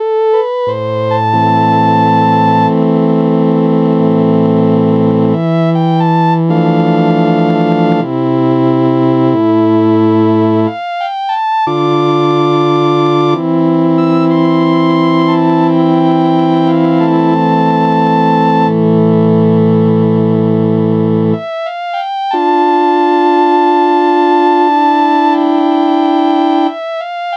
Am90 Novachord Ensemble

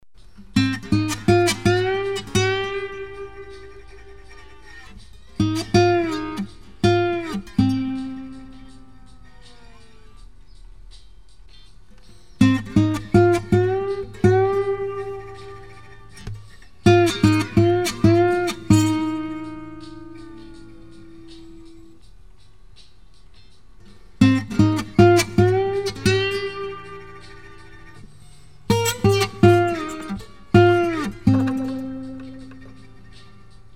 Babylon SLIDE

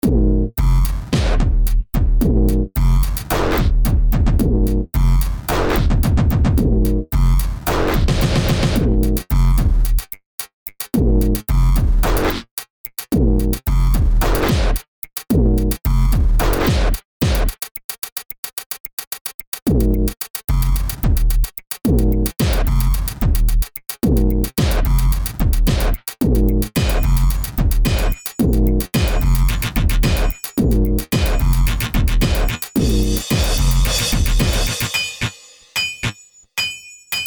Automaton Malfunction Drums

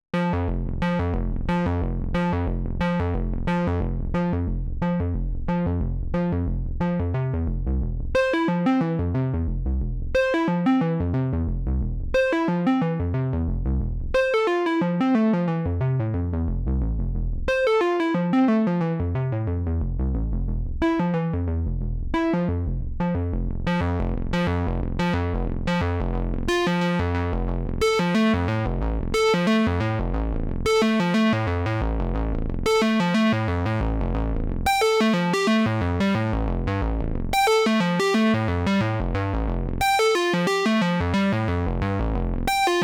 F 120 Impro bass riff

